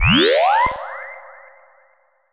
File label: Activate LogIn